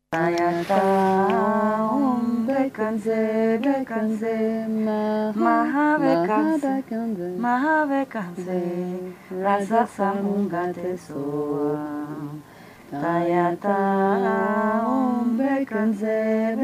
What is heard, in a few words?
monserrat chants indian; Spanish street recordings